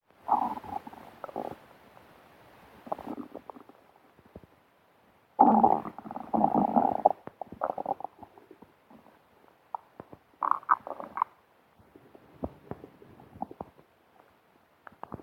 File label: Bowel Sound; KittySensor